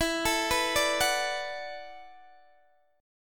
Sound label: E 9th Suspended 4th E down Guitar DADGAD